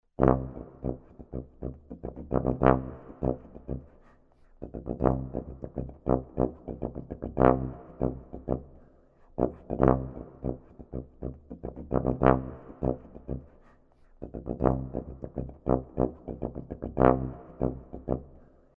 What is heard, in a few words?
Funk tuba; Grundton und Ghost Notes; Grooves for jazz tuba